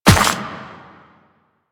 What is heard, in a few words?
Run Dirt, Footsteps; Warframe; Quests; The New War; Kahl